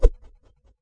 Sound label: kung fu staff, swings